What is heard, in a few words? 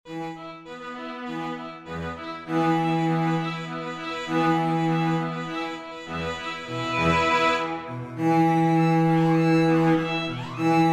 String Quartet